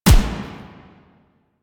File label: Assault Rifle Fire Initial; Weapons